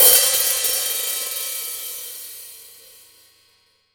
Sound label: OHH, Real Drum Kit, SWING KIT; Best Service ProSamples vol.43 - Real Drum Kits